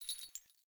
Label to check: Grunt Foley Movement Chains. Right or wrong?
Right